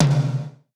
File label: Tom, Grime, 138bpm; Zero-G Sound Sense Grime Scene; Construction Kits; Da Suspects